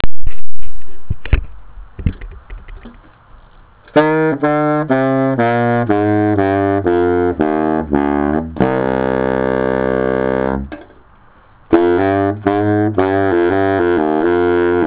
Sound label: low a, sax